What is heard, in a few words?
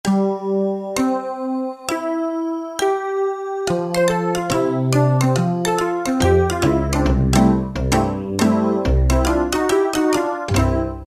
Steel Pick, Roland D-50; Factory preset demos